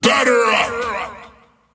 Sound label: swipe; skel arc; dota; skeleton king